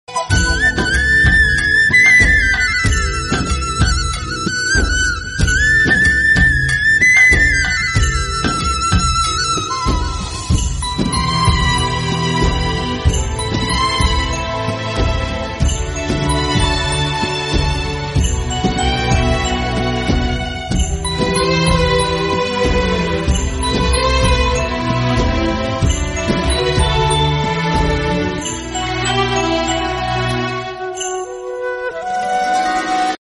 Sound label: instrumental flute